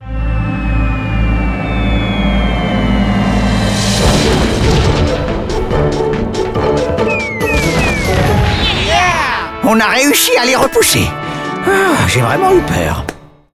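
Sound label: Outro Victory French; arthur and the revenge of maltazard; wii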